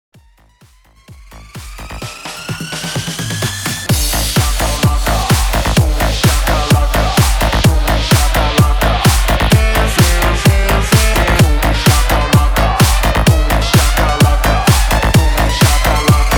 PARTY BANGER 128 BPM CLEAN

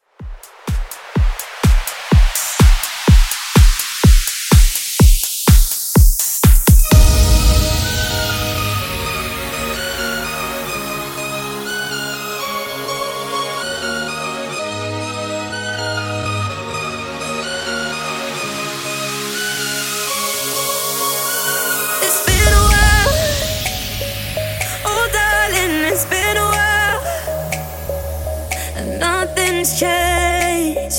Club Extended Mix 125 Bpm

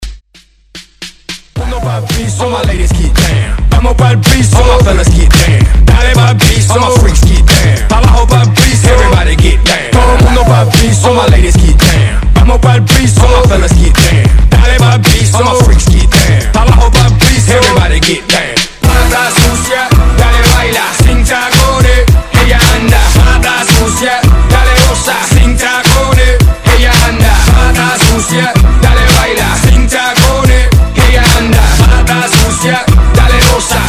Latin Redrum

